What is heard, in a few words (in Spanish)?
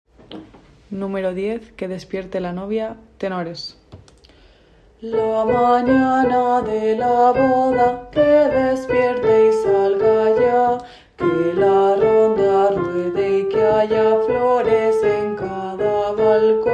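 Que despierte la novia tenor; Bodas de Sangre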